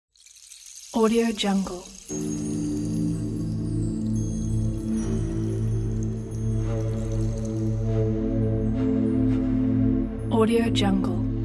ambient atmosphere soundscape; archimusic

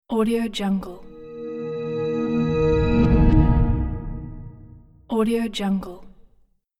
gentle fantasy transition; sonic-boom

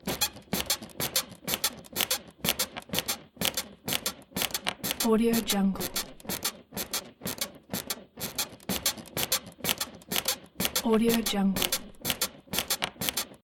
comedy mattress springs squeak loop; blazznet

